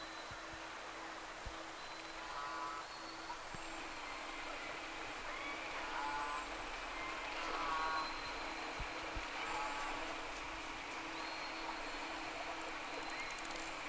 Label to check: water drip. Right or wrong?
Wrong